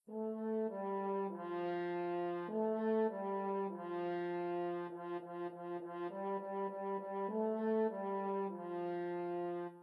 french horn

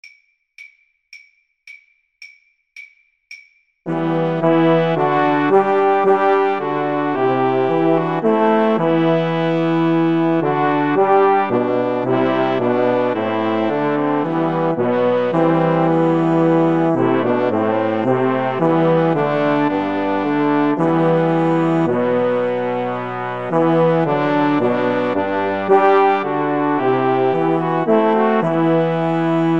emmanuel, recorder

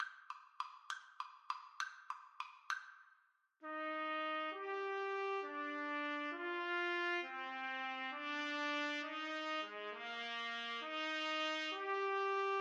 trumpet duet

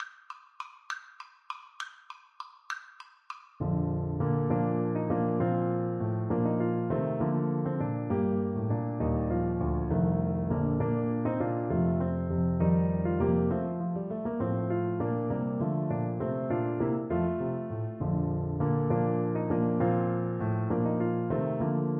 handel suite gig trumpet

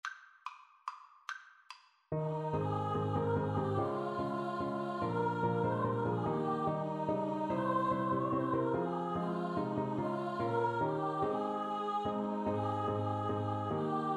CHOIR; We Wish You a Merry Christmas

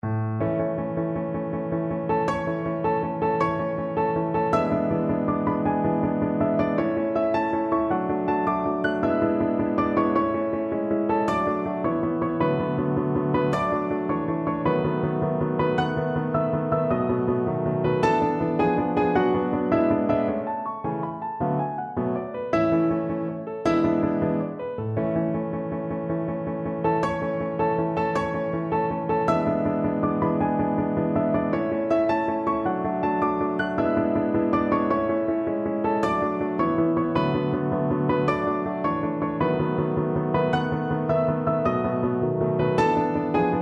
italian, piano; mendelssohn

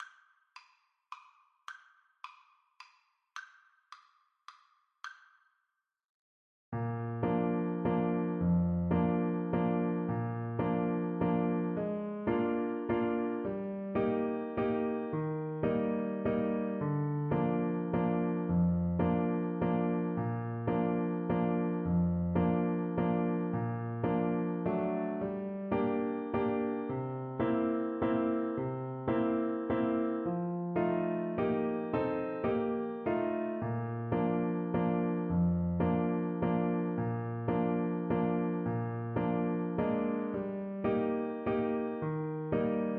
Trombone

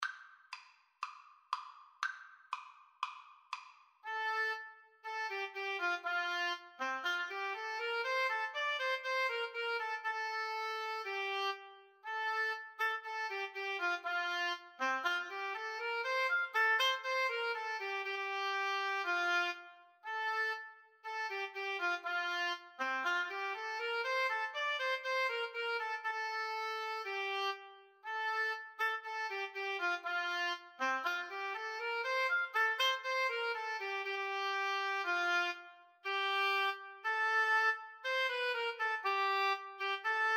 Clarinet Oboe Duet

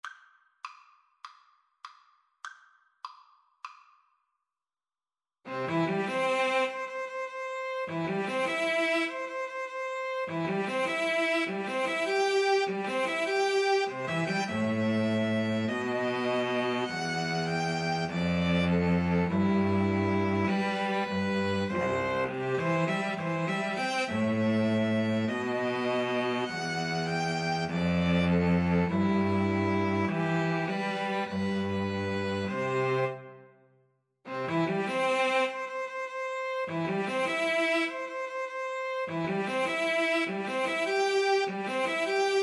wedding march, 2 Violins Cello; mendelssohn

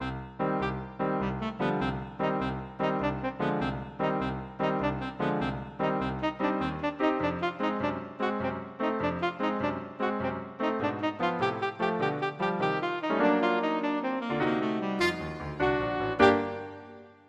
saxophone; dukas; sorcerer apprentice

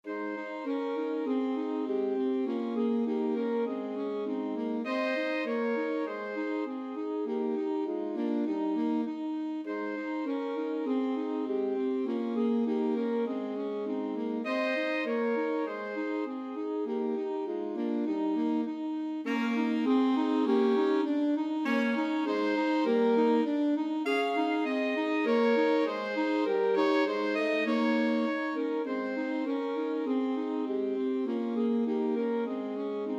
alto sax trio

